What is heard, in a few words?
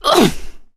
melee swing